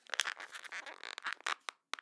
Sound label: Rubbery Plastic Crackle